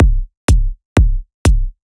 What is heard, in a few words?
Strip 124bpm, House Drumloops; Zero-G House Fabrik; 90 s Sample CDs